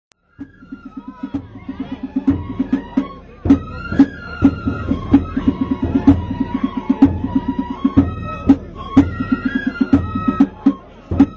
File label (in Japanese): 阿久比囃子, 神楽囃子